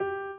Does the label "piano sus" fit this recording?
Yes